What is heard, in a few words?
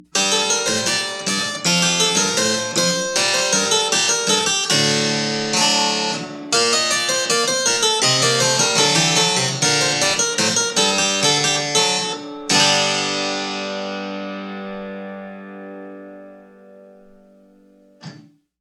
Flemish harpsichord; Richard Farnaby, Fayne would I Wedd; Three Centuries of Harpsichord